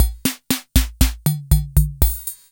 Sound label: FILL, KR55; INIS - Funky Xtreams; KR55 LP2